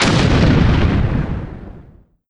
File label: explode, revanent; doom3